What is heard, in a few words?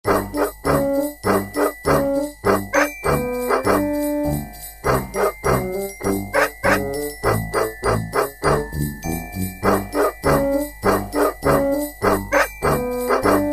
Jingle Bark Jingle Bark